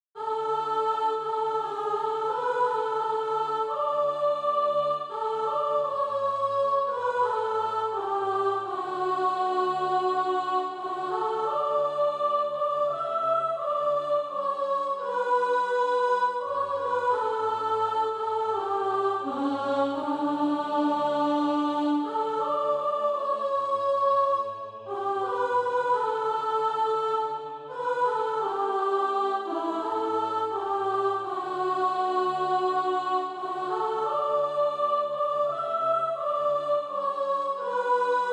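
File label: soprano, Practise Music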